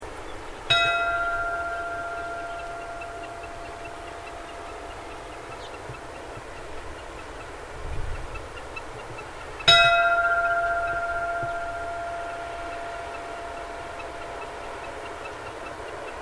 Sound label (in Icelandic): gilsbakkakirkja likhringing, kirkjuklukkur